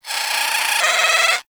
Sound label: Avichaea Vocal Idle; Hunting Critters